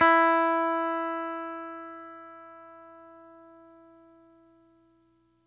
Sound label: OPEN E1, Guitar; Quantum Leap; 90's Sample CDs